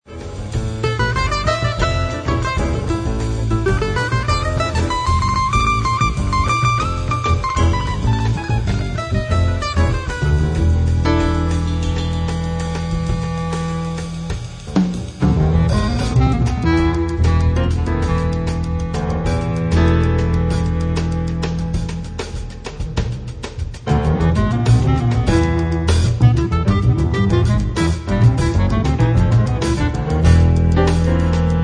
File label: gafieira jazz